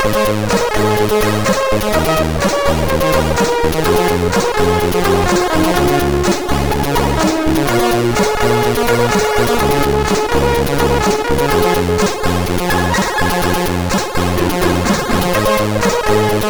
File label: Intro, sid MOS6581R4